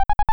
Bad Key Machine; mother2

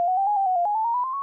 Refuel; mother2

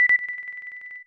ping; mother2; Sky Runner & Devil's Machine

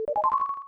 Pray; mother2

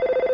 Phone ring; mother2

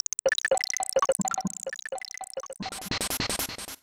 Paralysis; mother2

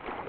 Shallow water; mother2

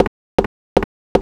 steps; mother2

